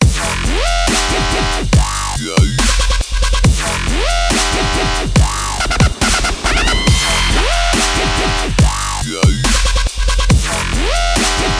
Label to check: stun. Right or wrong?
Wrong